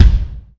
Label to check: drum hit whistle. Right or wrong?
Right